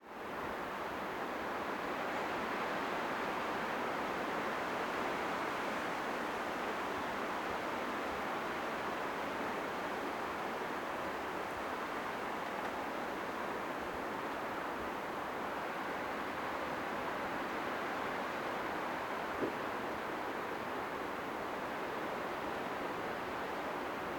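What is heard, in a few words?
Wind, Exterior, Breeze, Treeline, Whoosh, Noise, Constant; Hzandbits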